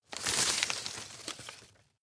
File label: rustle medium short; berufe; foerster